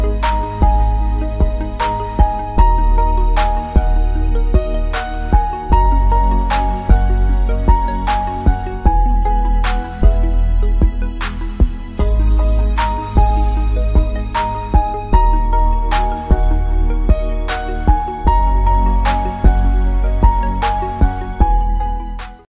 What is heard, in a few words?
english ringtone